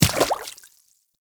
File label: Medium Run Water, Footsteps; Enemies; Warframe